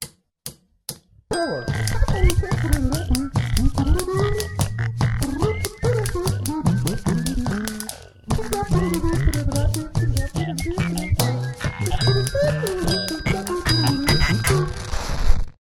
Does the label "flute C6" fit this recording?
No